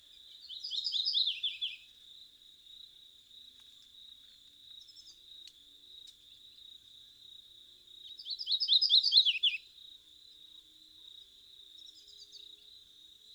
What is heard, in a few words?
Painted Redstart, Myioborus pictus; warblers